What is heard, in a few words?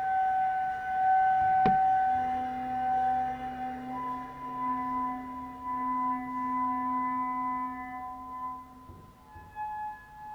Clarinet